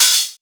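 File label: Open hi-hat; Trance; Zero-G Trance Inducer; 90 s Sample CDs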